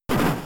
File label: Low Kick; Pokemon; Gen 3; attack moves; RSE